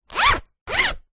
plastic document wallet zipper; zapsplat

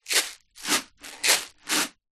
fuse beads in plastic tub movement shake

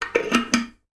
household sink plug put in; sound effects one

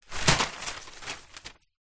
foley paper sheets many throw at wall

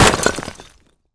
Cement Wall Hit; Blastwave FX

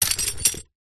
animals horse saddle set down ground; zapsplat

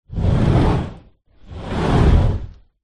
household door sliding patio

